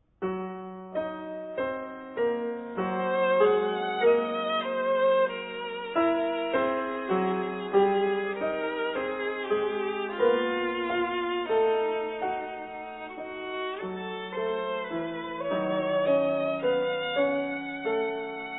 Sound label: violine